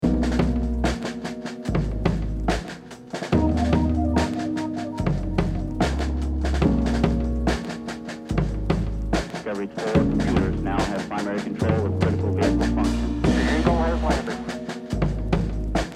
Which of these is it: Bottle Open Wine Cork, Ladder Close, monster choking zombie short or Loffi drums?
Loffi drums